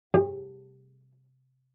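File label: Cello pizz fortissimo sul G G2; MIS Pitches - 2014; Strings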